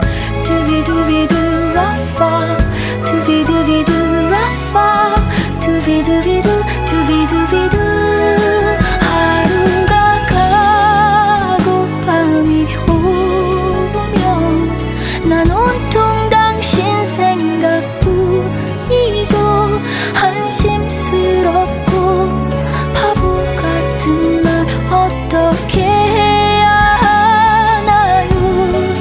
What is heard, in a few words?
rain melody, sms; ringtones